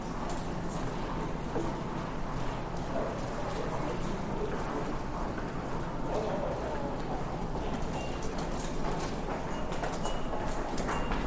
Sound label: rail ticket gate